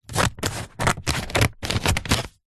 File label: office scissors blunt cut thick paper; zapsplat